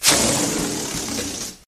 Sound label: balloon deflating